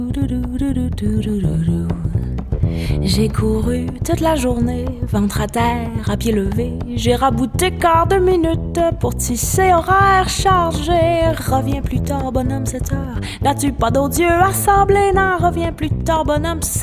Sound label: Blues